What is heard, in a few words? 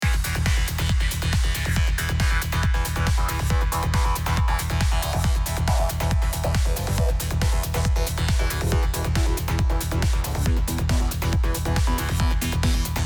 Better, Smooth Melody